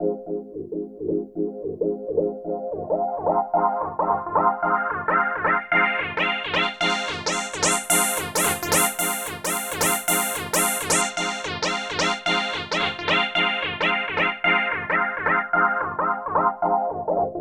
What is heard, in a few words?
Progressive Synth, Trance